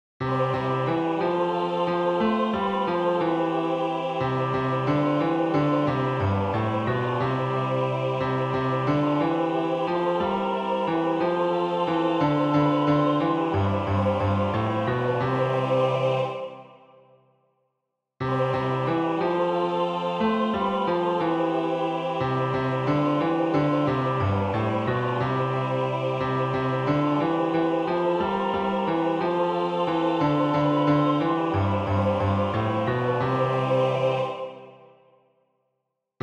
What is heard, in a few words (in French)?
Hymne au St. Laurent Refrains Basse; Balade à Saint-Vallier